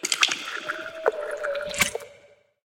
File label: spider egg lay; darwinia demo